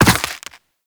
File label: Land Soft Ice, Footsteps; Characters; Operator